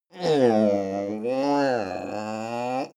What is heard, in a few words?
male grumble cranky; menschen; koerpergeraeusche; soundpool